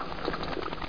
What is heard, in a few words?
bats; rochaos